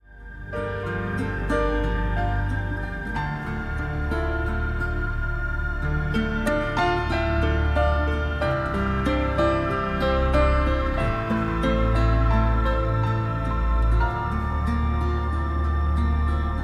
National

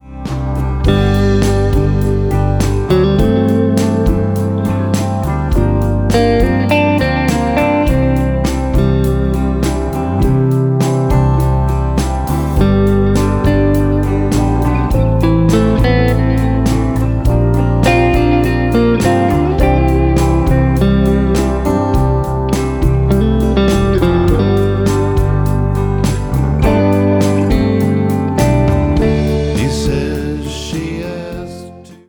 Gretsch 6120, CHET ATKINS HOLLOWBODY; GRETSCH 6120 CHET ATKINS HOLLOWBODY (57)

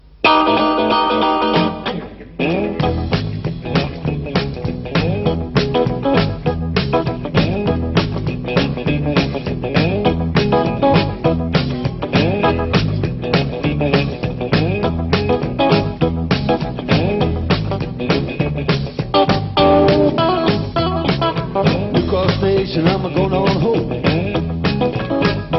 Fender Strat 61; Paris 1978

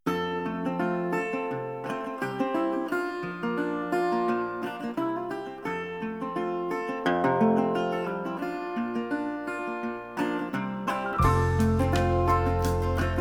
National

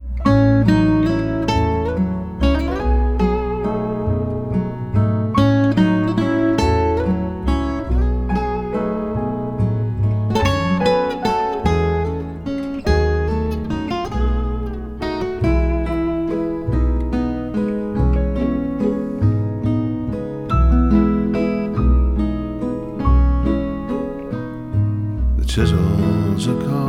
Monteleone

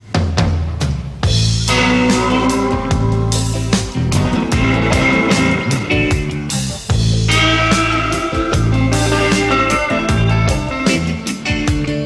Fender 61

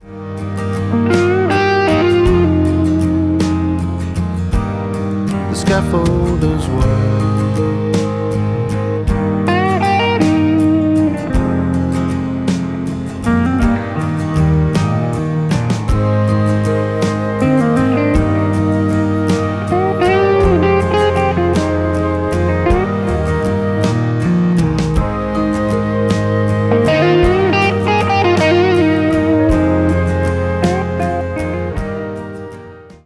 Gibson 59

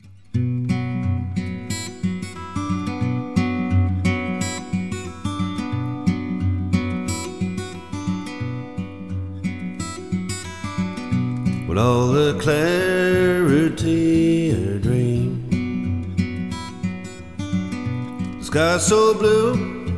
Gibson J45

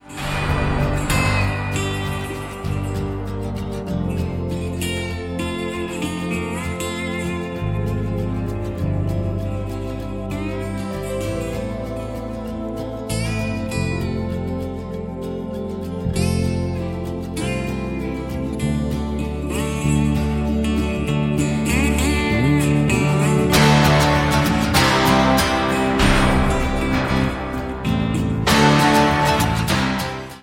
National 1937

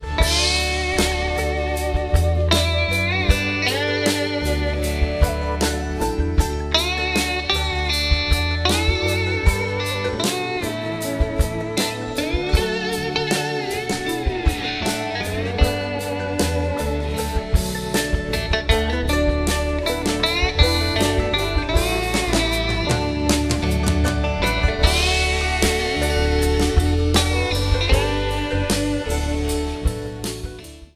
Danelectro, guitar; DC 2013

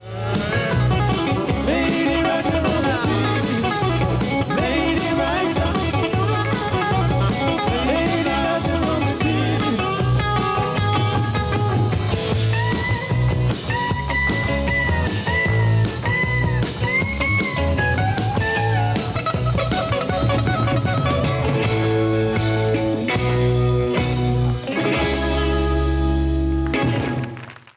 Fender 62; Rockpalast 79

